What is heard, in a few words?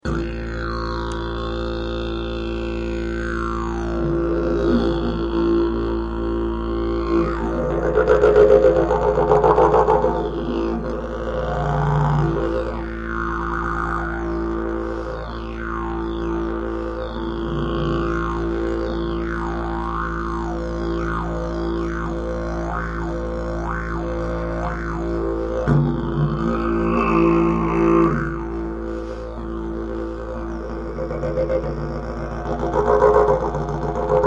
didge